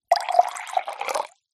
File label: food drink water pour into glass